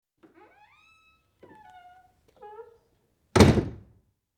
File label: Workshop Door Closed; Construction; BBC Sound Effects Library